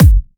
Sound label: Kicks; Vengeance Samples